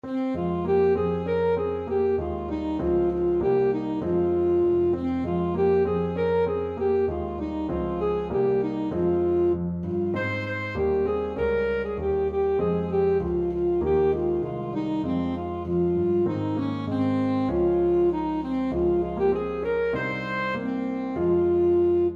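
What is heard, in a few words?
carol, alto saxophone; huron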